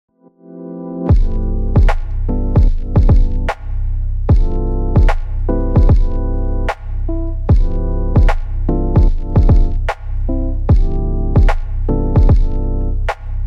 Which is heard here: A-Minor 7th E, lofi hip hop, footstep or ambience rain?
lofi hip hop